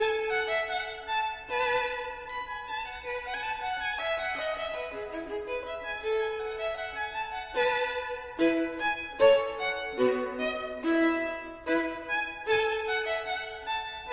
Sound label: Gavotte en rondeau, Violin